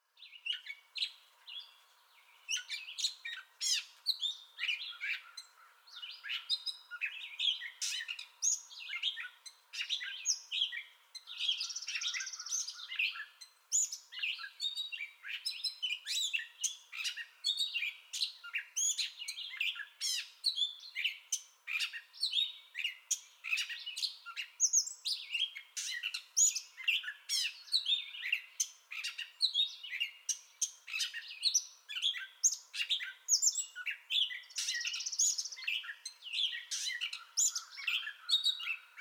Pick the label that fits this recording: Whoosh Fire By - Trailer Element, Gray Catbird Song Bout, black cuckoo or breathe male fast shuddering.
Gray Catbird Song Bout